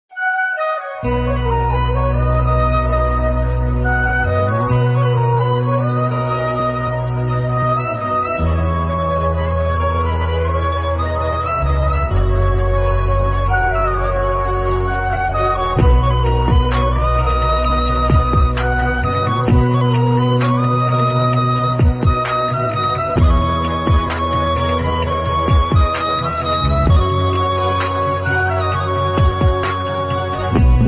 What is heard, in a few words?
Instrumental Ringtone; Valimai